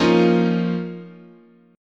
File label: F 7th Suspended 4th Sharp 5th, chord